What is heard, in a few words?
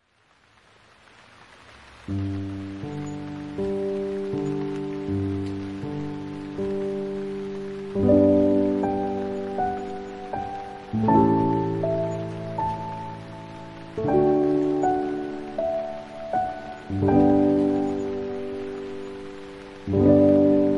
Aura Lee (With Rain), Calming Piano Music with Rain